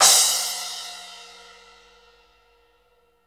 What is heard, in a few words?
CRSH SPLSH, cymbals; Sampleheads - New York City Drumworks VOL-1; SW CYMBALS